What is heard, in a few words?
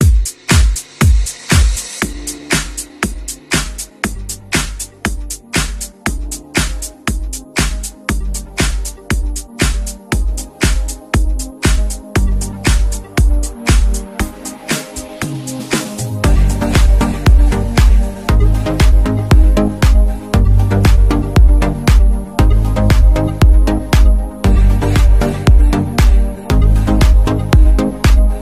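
turkish ringtone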